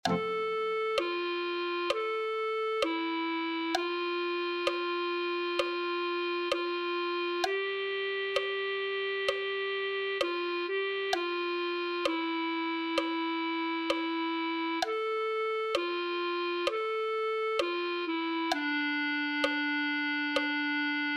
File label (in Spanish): clarinete1